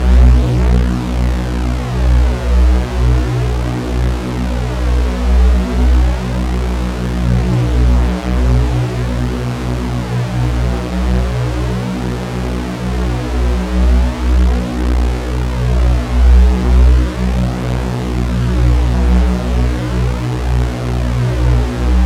Mod Reese Tube

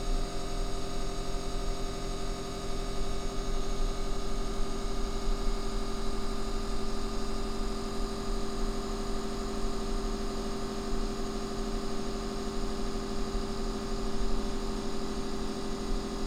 Air Condition Motor Noise

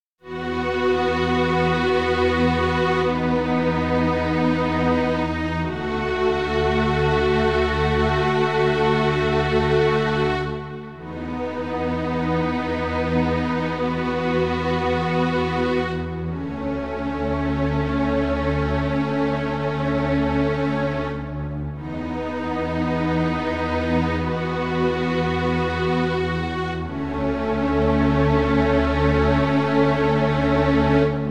Mellow Slow Strings Theme

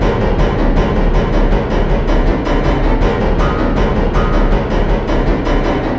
Scary Piano Music

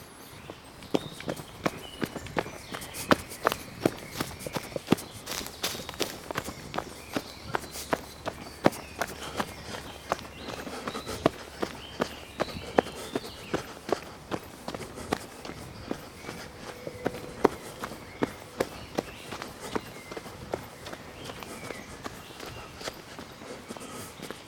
Running Through Forest

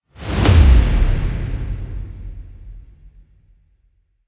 Whoosh Epic Sword Smith

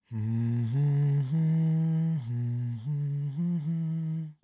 Male Hum

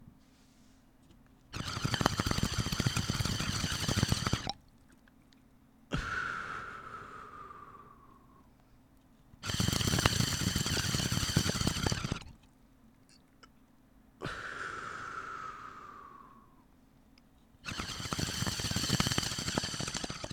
Hits From Water Bong

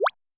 Retro Bubble Shot

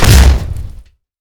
Explode Small

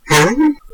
Mouse Huh

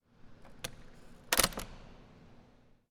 Door Clicking Shut

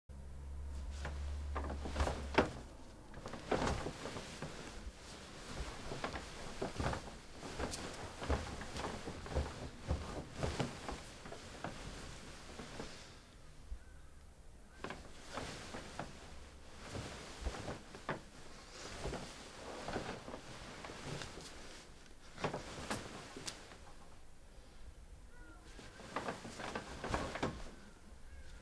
Movement On Bed

